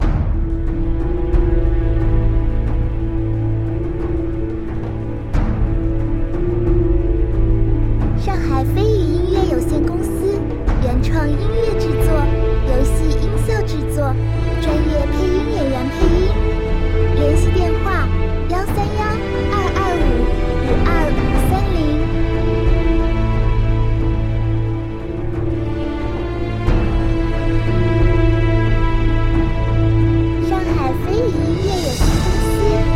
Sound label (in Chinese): 大气史诗悲壮; 口袋联盟